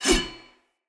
Sword Whoosh; Weapons; Swords; Fenn Jotar Sword

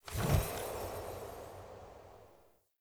UI Note Reveal Text

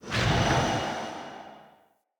Dodge Whoosh; Enemy; Abilities; Characters; Circade

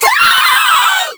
Volatile Runner Kamikaze Scream; Warframe; Proto Infested; Abilities

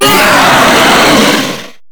Angry; Enemies; Vocals; Warframe; Grineer Hammer Boss

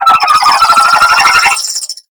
Space Drone Attack; Warframe; Corpus Space Drone

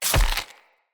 Claw Small Impact Flesh; Weapon Impacts; Enemy; Unarmed

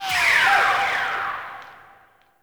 Rifle Charge Projectile, Weapons; Warframe; Zariman Grn Wraith Rifle

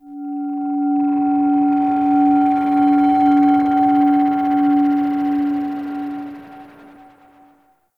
Temple Mid Bells Swell, Corpus Ship ambience; Temple One

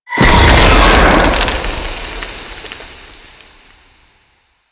Infested Moa Nanite Spawn

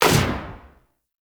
SMG Shot; Weapons; Warframe; Tenno; Protea

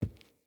Boot Walk Wood, Footsteps; Characters; Enemy; Boots; Sinecure; Melee Grunt

